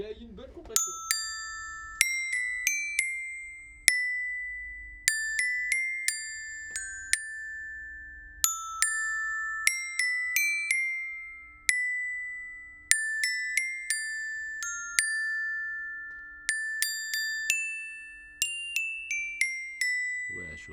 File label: xilo comp max; pendulum; quartet